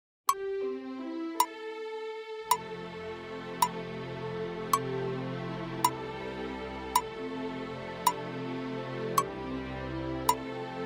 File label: Backing